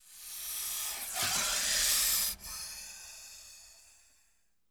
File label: Snake Hiss; Animals; Sony Pictures Sound Effects Series Volumes 1-10; Sony Creative Software